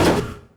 gear 2 shift up in, Kart

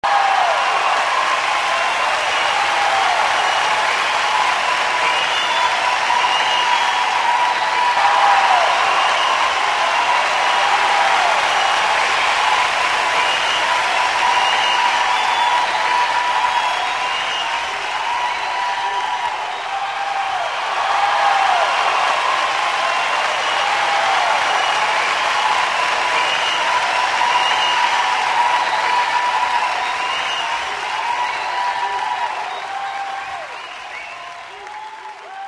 Fans normal

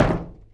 impact cone; Kart; AOS RF1 Kart Demo

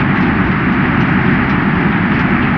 road stones; AOS RF1 Kart Demo

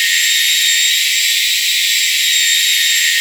Trans on high, Kart

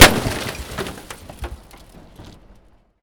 impact car very light; Kart; AOS RF1 Kart Demo

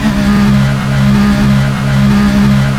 in PHIGH; France; AOS F1 2022

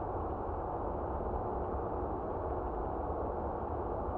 road dry; Kart

